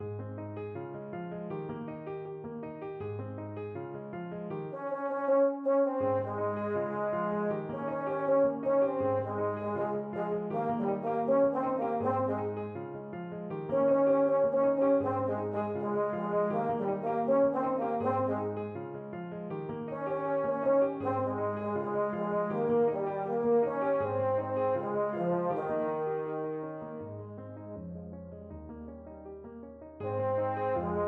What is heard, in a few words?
EUPH, euphonium